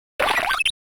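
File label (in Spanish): Grito, Pokémon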